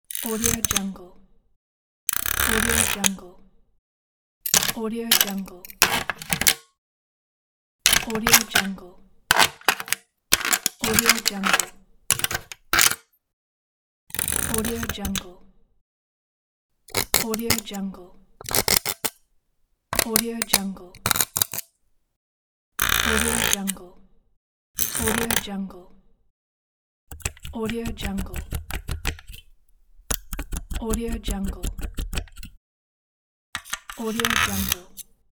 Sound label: domestic object effects pack